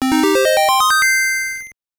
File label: Jingle; Loopz; GB